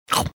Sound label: funny bite